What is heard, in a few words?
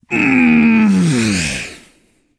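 nv grunt lift, male; cernunnos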